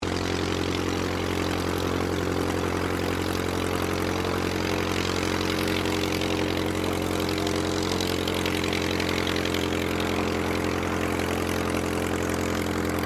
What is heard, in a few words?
AIRCRAFT DH82A DEHAVILAND TIGERMOTH TAXI; Mixed selection of Tigermoths from the 1940s; Airplanes